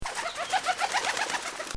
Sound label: Pigeons Taking Flight